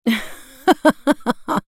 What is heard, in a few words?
laugh; Karma; Character Voices - English